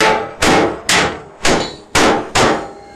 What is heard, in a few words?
multiple hollow metal impacts ringing